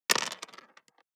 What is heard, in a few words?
DICE on Plastic, Throw and Roll, Standard, 4 Four Dice; Samples; ArticulatedSounds; DICE Sfx Party (Extended)